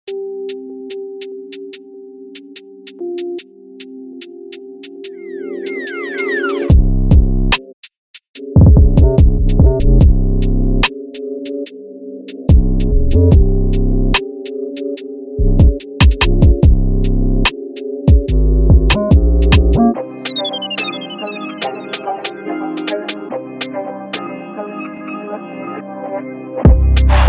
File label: drill hard hip hop; big fish audio